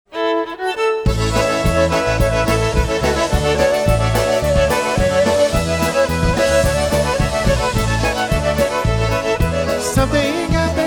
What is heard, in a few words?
Hello Beautiful Polka Live Jam